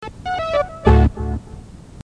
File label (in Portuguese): Jingle Fim Noticias